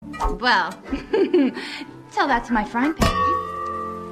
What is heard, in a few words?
Frying Pan